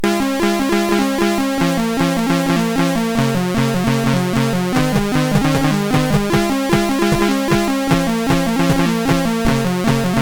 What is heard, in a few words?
Deception, sid MOS6581R3